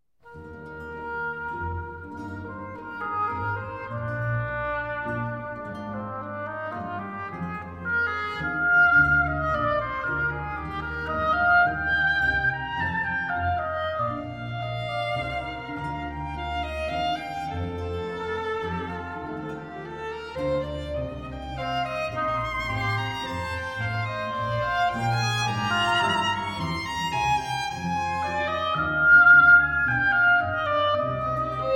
Concerto For 2 Harpsichords, Strings, and Continuo In C Minor Adagio; BWV 1060